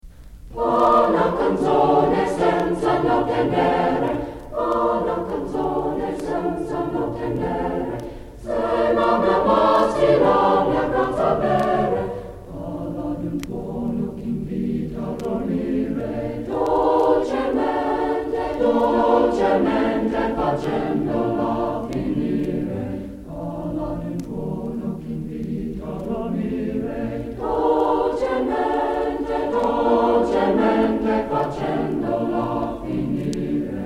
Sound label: A Choral Concert by the A Capella Choir